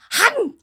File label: Big Chomp